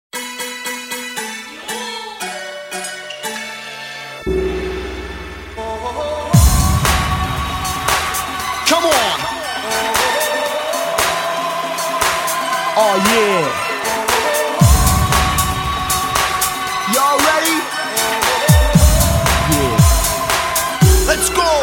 Kung-fu Mix

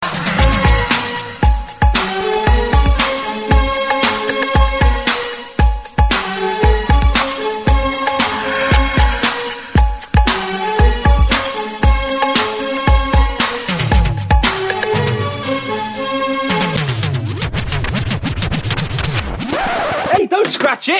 Electro

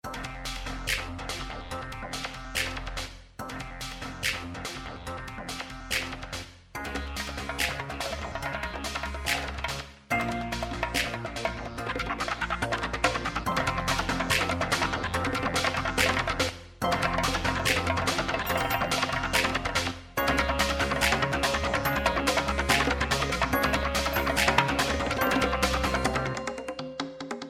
Metal Good; Sonic Cd

